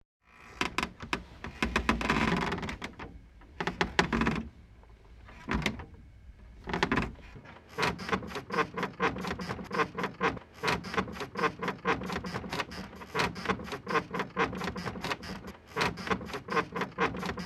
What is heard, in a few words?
Muelle Cama Chirrido Saltando Bed Springs Squeak